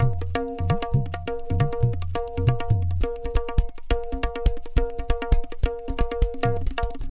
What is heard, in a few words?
Tabla, 135 BPM; Zero-G; Pure Tabla